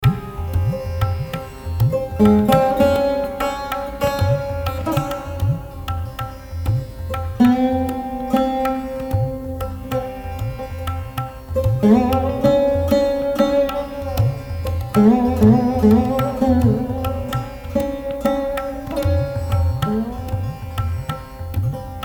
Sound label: SITARKHANI Jogiya Kalingra